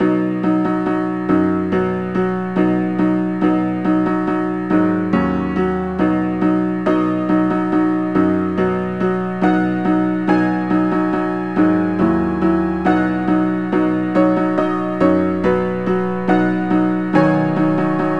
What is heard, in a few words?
Dance Rumba Slow; Knigge Spielend zum guten Benehmen; NDS